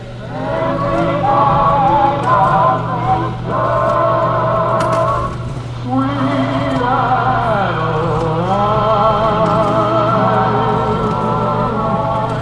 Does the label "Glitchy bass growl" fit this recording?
No